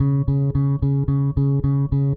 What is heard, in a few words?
Eighth Notes C#, Bass; Bass X